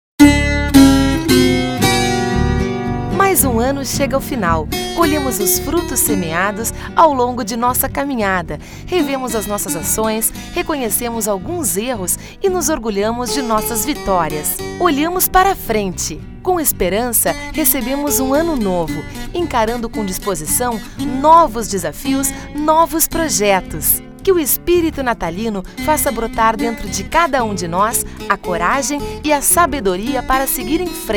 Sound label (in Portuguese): Espera Telefonica Natal; Ecoclinica e Tomoclinica